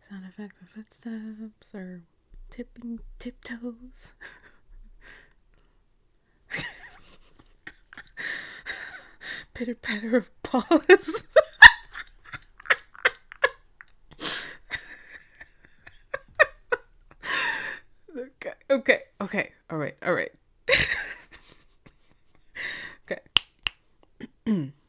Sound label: snort